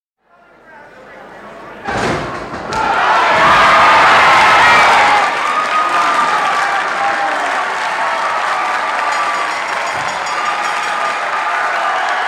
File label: Good throw & applause - bell into PA & chatter; BBC